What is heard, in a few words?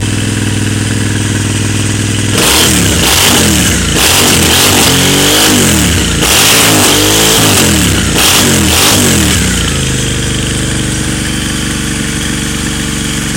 triumph bonneville scrambler with non standard pipes noise